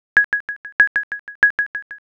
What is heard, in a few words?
ecg